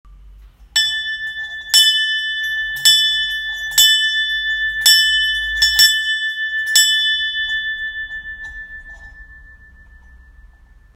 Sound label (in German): Glocke